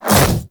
Splitter Melee Swipe Small; Warframe; Infested; Grey Strain

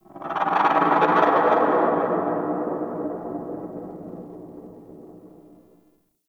Beast Cry; Warframe; Tau

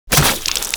Hook Tongue Spit; Enemies; Attacks; Warframe; Grineer Ghouls; Ghoul Devourer

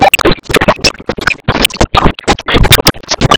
Ambience Thunder; Cinematics; Warsongs; Warsong Prologue

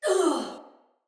Death Finisher Soldier Female; Battle; Barks; Dialog; Sinecure Soldier Female B

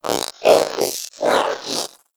Zanuka Companion Vocal Breath Run; Pets; Warframe

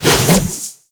Dodge Whoosh; Warframe; Wraith

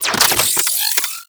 Briefcase Akimbo Pistol Reload; Warframe; Corpus

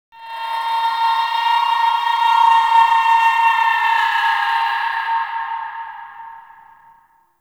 Void Ghost Capture Started; Void Tower

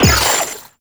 Focus Ultimate Ui Charge Up; Focus Ultimates

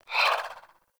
Swing, Weapons Melee; Warframe; Modular Melee

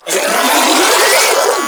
Proto Infested Ancient Mid Spin Scream; Warframe; Abilities

